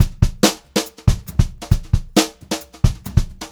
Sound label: 136 VARI, Brushed Drum Loops; USB Soundscan vol.39; AKAI; HOTROD